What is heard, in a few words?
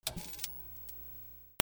HOUSE